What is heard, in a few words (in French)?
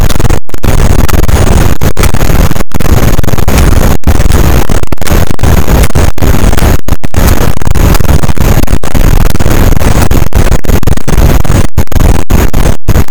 cachalot positif, boat; Physeter Macrocephalus